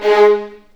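G#3, The Ultimate String Collection, violins marcato; East West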